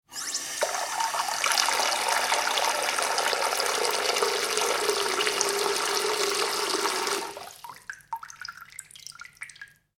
household sink water fill; Sink Filling With Water From Tap; Kitchen; McKinney Sound

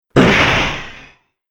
Karate Impact Smash, punch and hit

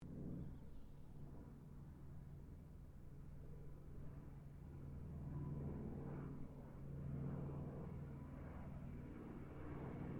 AIRCRAFT AT-6D HARVARD MK III PASS DISTANT; Temora Harvard MK III; Airplanes; Vehicles

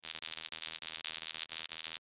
Data Transmission Fast Number Beeps; Data Transmission Spaced Number Beeps Fast; Communication; Blastwave

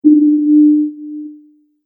Sonar Ping; Beep

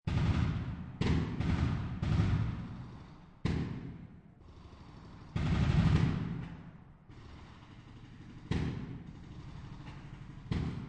Ambient Field Fire, Battlefield; Battlefields; War; VRSonic

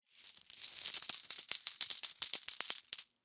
Foley Coins Count In Hand Movement; Money (Sound Effects:Objects)

